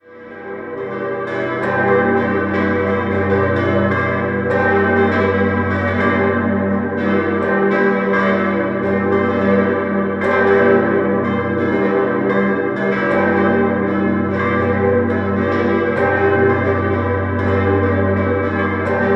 Bad Homburg Erlöserkirche, Glocken

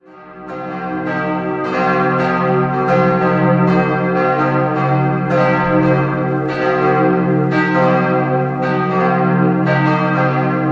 Pfeffenhausen, glocken

